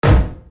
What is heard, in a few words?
monster Step; ProjectZ